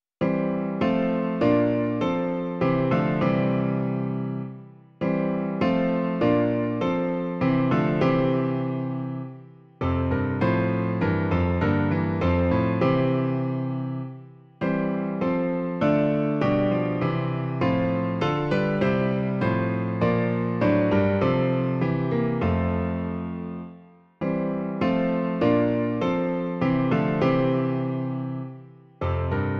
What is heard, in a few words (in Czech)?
klavír; Klavírní verze; Ó sešli pravdu svou